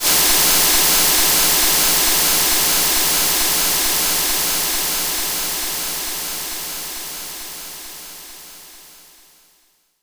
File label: Brush Roll, Roland JV-2080